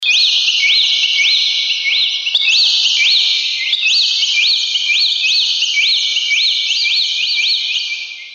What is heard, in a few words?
birds